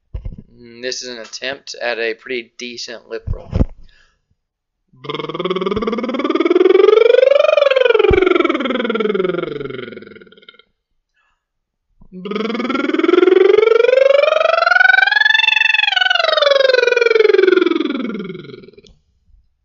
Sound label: Lip Rolls